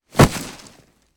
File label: Birds Fall